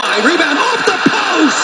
Hockey

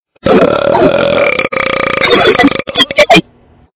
Belch - Arroto

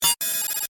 Mail recieved

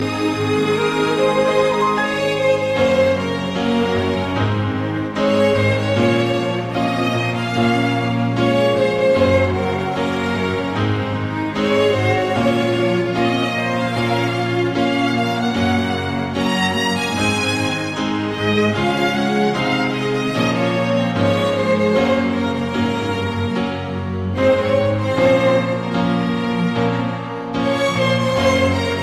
Music Relax

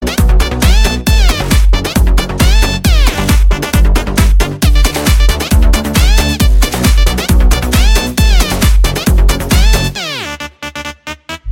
Music

